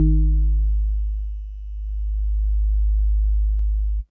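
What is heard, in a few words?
House Organ DX100 G#4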